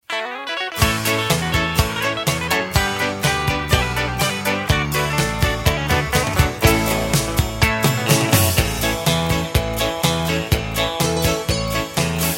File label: lowlead sample